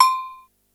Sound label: cowbell, Yamaha RY-8